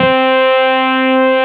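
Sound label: Wurlitzer C3 - L; USB Soundscan vol.09; AKAI; Keyboards Old School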